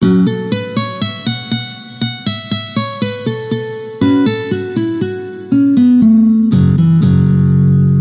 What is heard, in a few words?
chiness tone, ringtones